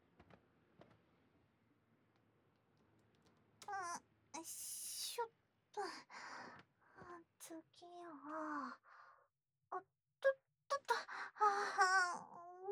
耳舐めパンツコキ; おち○ちんの弱点、いっぱいこすってあげる♪

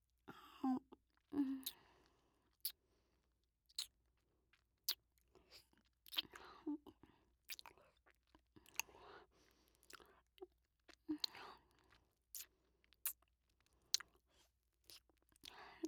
乳首舐め; ASMR; 僕の乳首舐め; ループ音声; 僕とお姉さんの音声

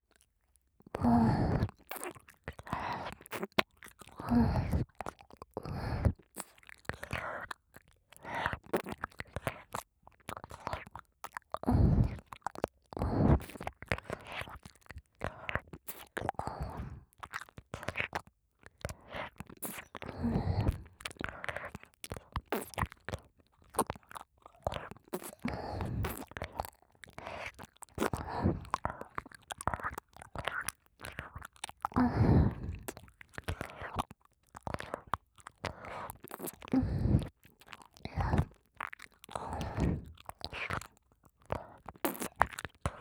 ゆったり耳舐め 左

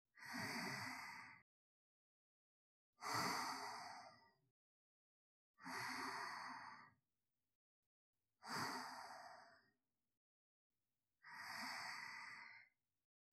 寝息

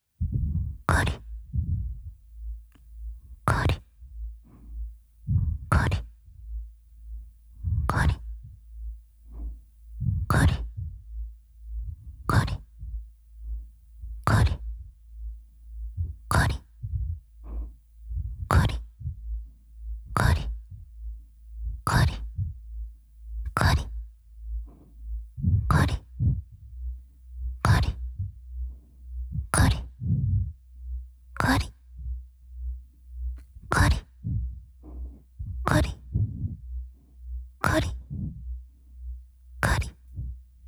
フリー乳首弄りループ音 こり…こり…

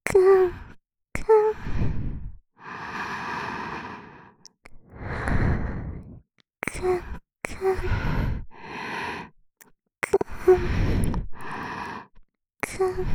くんくん…♪, ASMR, 右耳

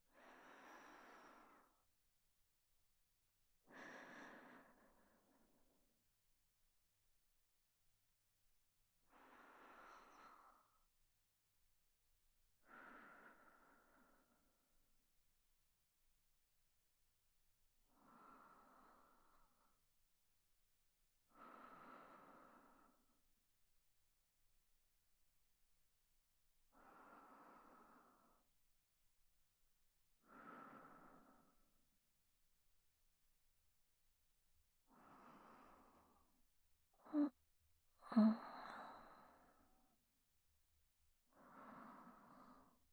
おまけの寝息 (右)

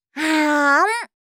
あーん(食べる)

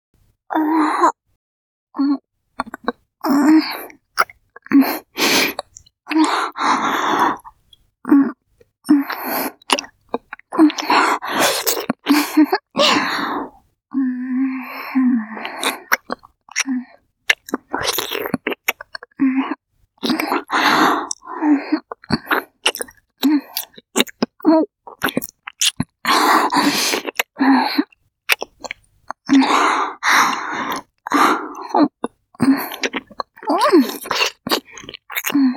はむはむ, ASMR, キス

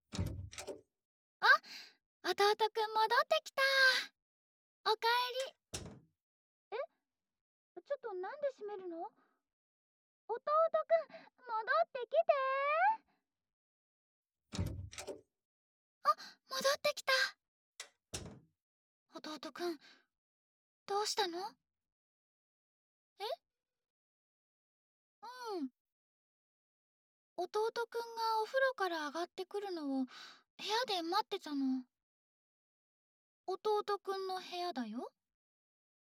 耳かきしてあげる♪; ASMR

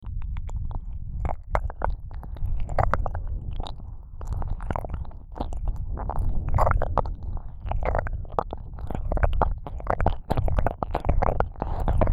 左耳舐めループ, 耳塞ぎ舐め

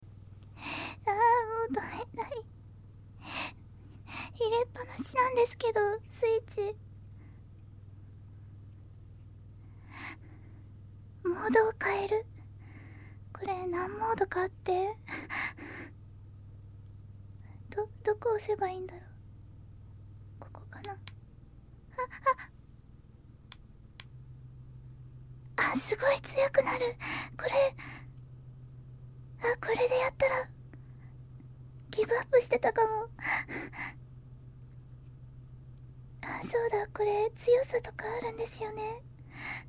フリートークの続き、吸引バイブモード切替のお試し

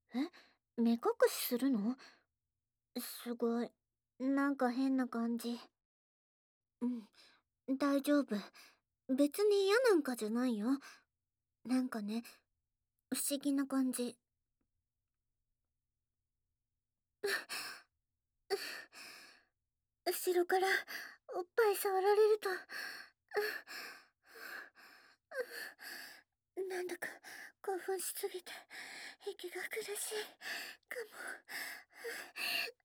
SEなし 手マン＆ローター＆クンニ; そ、そこ、指で強く擦らないでぇ…

